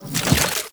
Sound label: Rifle Void Mode Switch; Weapons; Warframe; Zariman